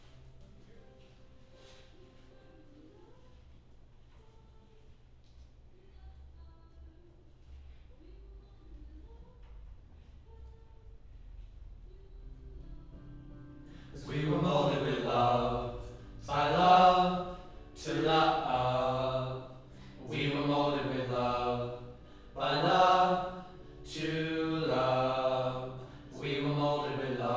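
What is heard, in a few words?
Choir Harmony Low L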